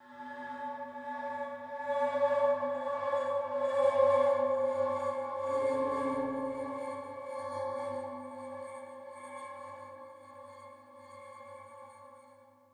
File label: Dungeon; SFX; Ambient - General